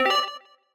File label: submit